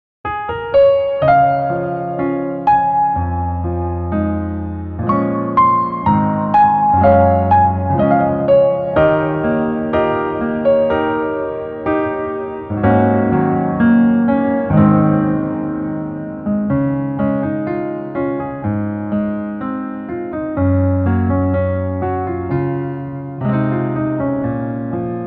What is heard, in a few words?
Piano